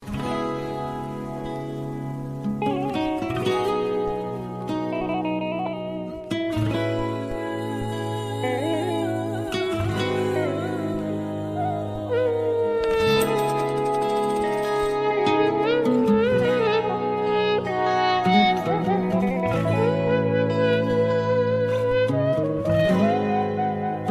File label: arabic guitar ringtone